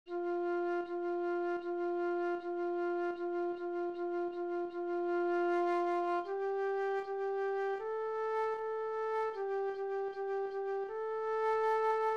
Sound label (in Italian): PICCOLA CANZONE LENTA Flauto